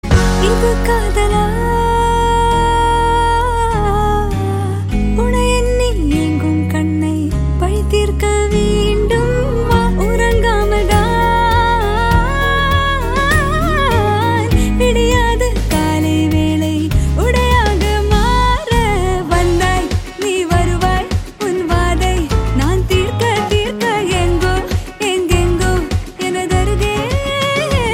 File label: Love Female Ringtone